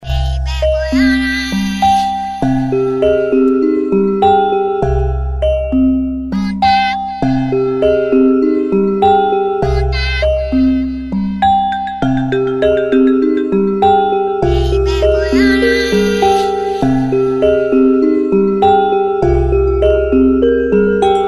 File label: diamond jewellery TV ad music; Ira; Your Name Ringtones A To Z Names